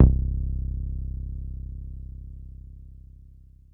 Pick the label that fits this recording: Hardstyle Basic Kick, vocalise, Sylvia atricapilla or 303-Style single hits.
303-Style single hits